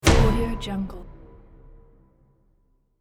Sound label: cinematic impact